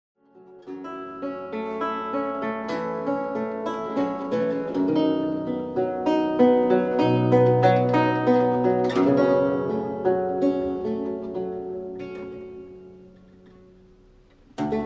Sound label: Lute; BABYLON’S FALL